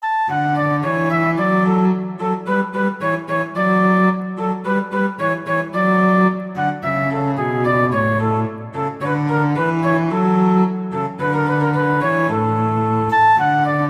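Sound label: Duet, horn; Mozart; Duets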